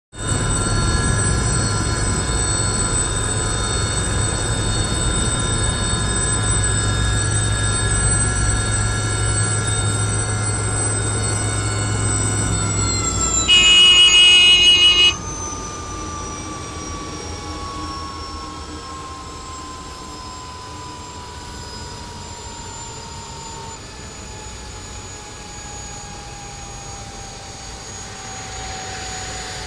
Huey Helicopter Interior Perspective Stop & Engine Wind; Planes & Trains